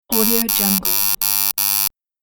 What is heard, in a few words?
scifi loading bar